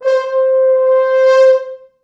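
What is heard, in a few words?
French horns, four, c4; East West; Quantum Leap Horns Sax; 4 FrHorns fts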